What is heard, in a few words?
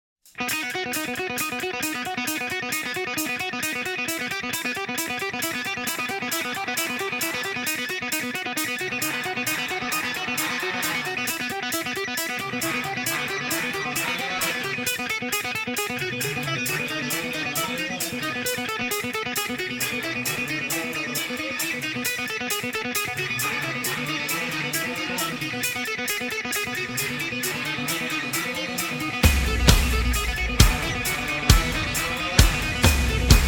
Instrumental